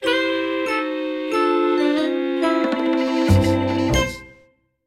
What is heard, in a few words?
Game Over